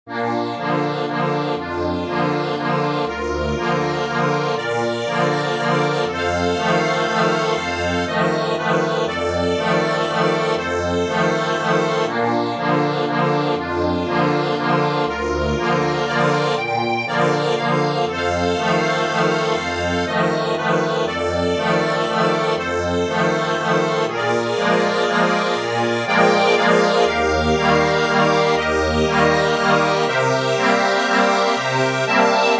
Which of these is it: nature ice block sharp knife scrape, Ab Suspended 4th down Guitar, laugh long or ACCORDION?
ACCORDION